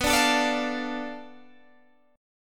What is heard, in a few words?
B Minor 7th Flat 5th B, Guitar Standard; Bm7b5; strummed; 19,17,19,19,18,17; JGuitar